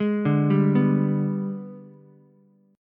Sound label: Minor Major 7th Flat 5th, Ukulele; G#mM7b5 arpeggiated